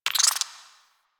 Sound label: Alchemy Lab Insect; Warframe; Hub; Liset Alchemy Lab